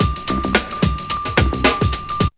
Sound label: break; Zero G - Man Machine